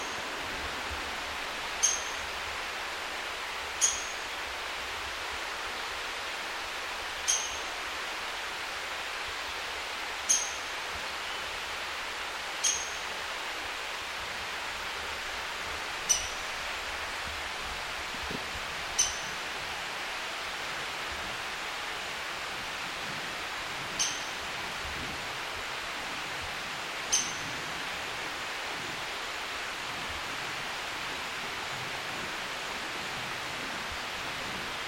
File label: Downy Woodpecker Picoides pubescens